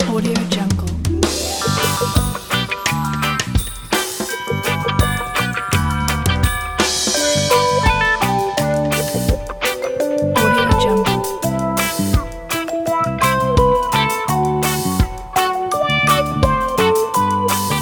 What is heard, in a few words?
jingle bells reggae